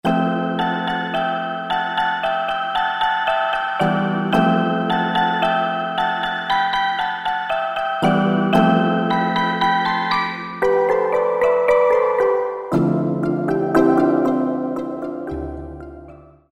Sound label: Drops, Yamaha TG33; Factory Presets; Preset bank 1